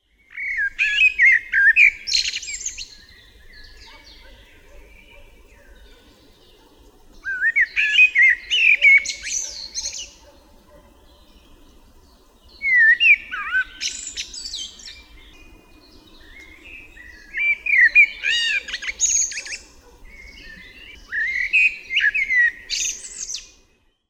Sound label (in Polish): kos, ptaki